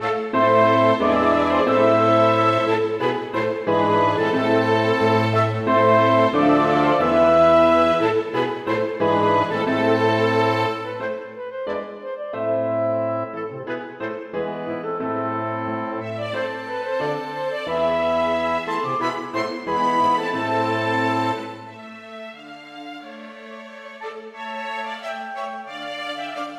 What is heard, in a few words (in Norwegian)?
Rigadon for stort ensemble; Bang